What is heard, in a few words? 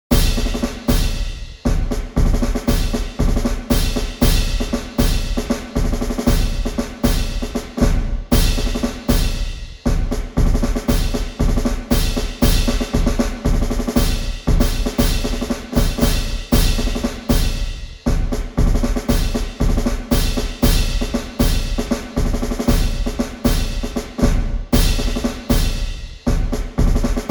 Drum Cadence; Super Trench Attack Bonus Tracks